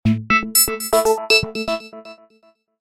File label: alert ringtone